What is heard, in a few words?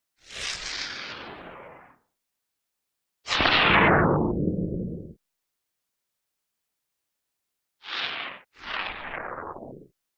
swoosh